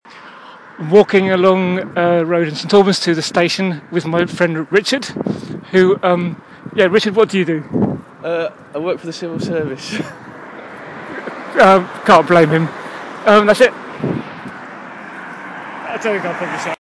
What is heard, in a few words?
walking to the station